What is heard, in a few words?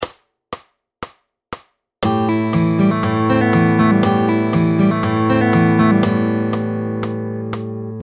Fingerstyle Picking Pattern; Fingerstyle Fun; P3 Supercharging a Picking Pattern